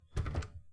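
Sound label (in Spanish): Puerta abriéndose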